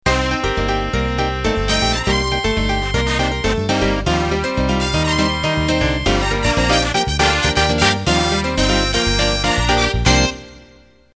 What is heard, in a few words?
Samba Ballroom End